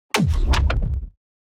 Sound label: building destruction low